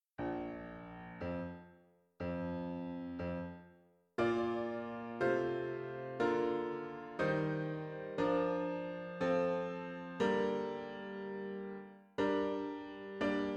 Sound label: Piano